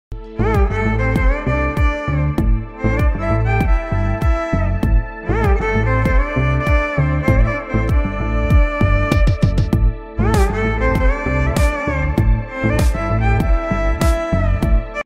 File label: ringtone violin version romantic song ringtone; viral love violin romantic